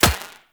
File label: Kinetic Plating Shield Hit; Warframe; Runner